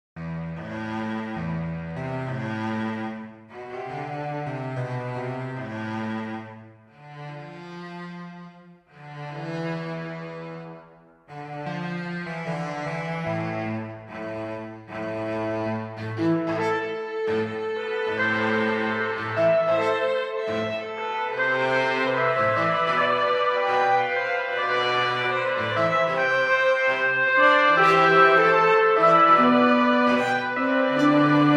fonovaia muzyka bez slov; dlia ekskursii

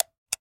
zvuki elektricheskoi girliandy

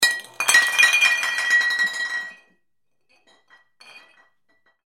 zvuki chokania na prazdnike

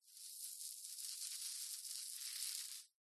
zvuki gubnoi pomady

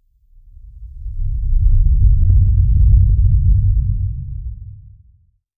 zvuki s effektom peremeshcheniia videokamery

